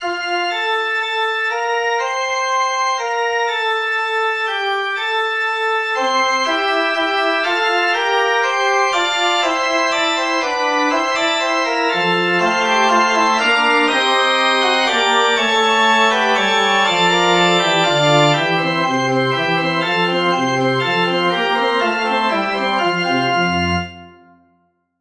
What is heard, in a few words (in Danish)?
forspil; aleneste gud